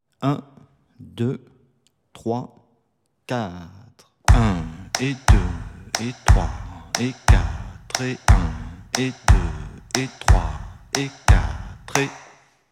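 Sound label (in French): methode pour travailler un rythme 060bpm, guitare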